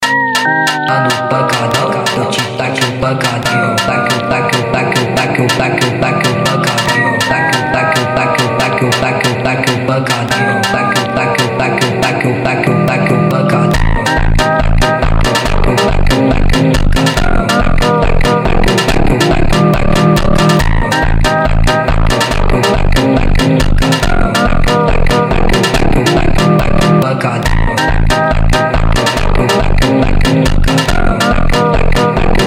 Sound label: HORROR FUNK